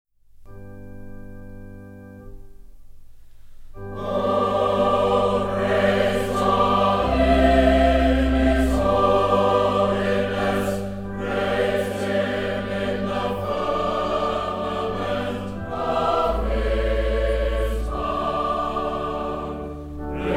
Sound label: Choir, hymns